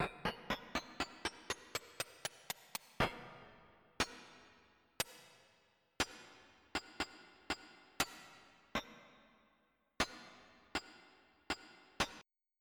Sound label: sword; Populous Demo